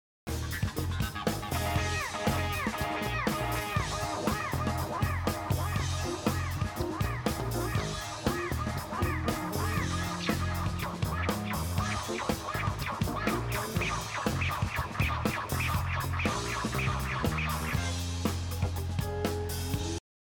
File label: fulltone; Trey's Guitar Rig; Amplification: 2 x Mesa Boogie Mark III Long Heads; 2x12 felt-covered vertical cabs. Vintage Leslie rotating speaker. Guitars: 1999 Koa. Floor Pedals